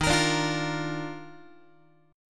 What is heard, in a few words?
Eb Major 7th Suspended 2nd Eb Guitar 4A 4E 5A 5D 5G sharp 6C sharp; EbM7sus2; strummed; JGuitar